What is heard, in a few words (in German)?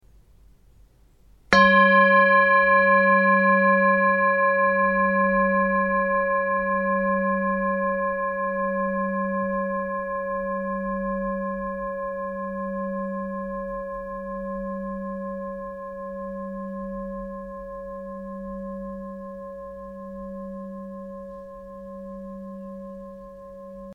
Klangschale